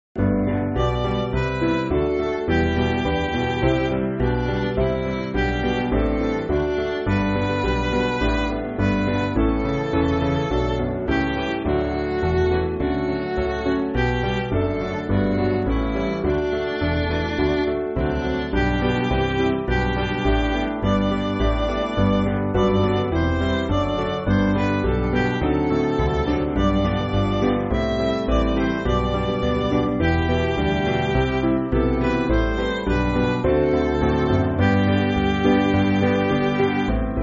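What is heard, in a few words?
Midi, Music Backing Files for Churches; For All The Saints; Sine Nomine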